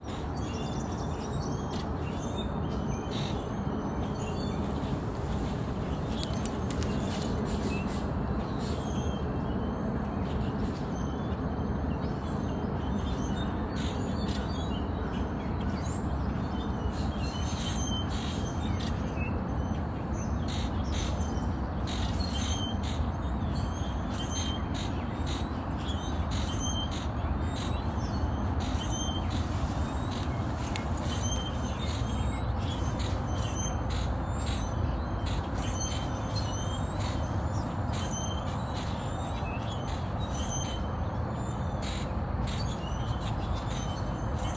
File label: grackles at bridge; field recordings; scout island